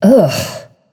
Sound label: ugh; smite; isis